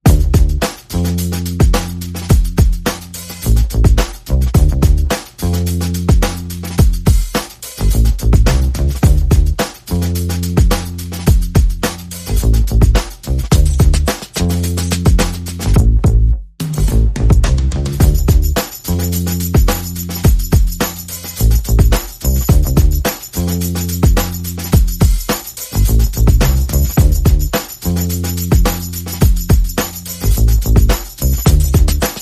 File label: Bass and Perc Only